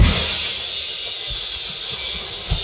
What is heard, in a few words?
Crash long; 音MAD; 孤独摇滚